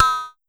Pollard Perc, Syndrum

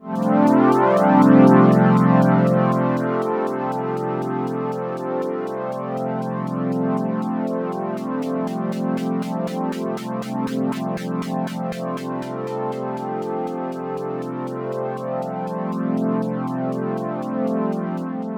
PAD CLICK, Synths

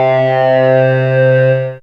synth, c, Sweep Synths; Dance Synths

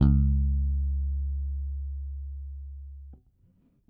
Whole Note One Shot C#, Bass; Bass X

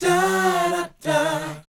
BOYZ C, Vocal Planet, R&B Dance; Spectrasonics Vocal Planet

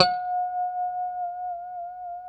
G5 pick harmonic, Guitars; Spectrasonics; Hans Zimmer Guitars VOL-1; JC-HARMONICS

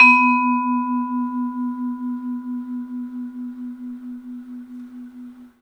bell; 3D Sound; 3D Keys VOL-1

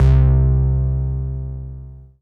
bass, Dance Mega Hip Hop Electro; EastWest

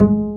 STR Cb Pizzicato; Roland L-CD702

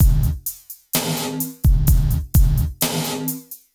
CB LOOP; Zero-G; Chemical Beats

